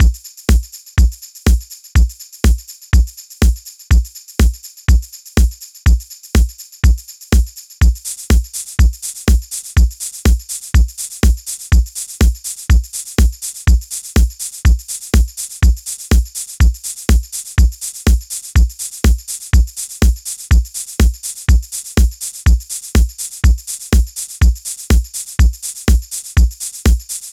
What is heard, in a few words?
DRUMS; Song Deconstruction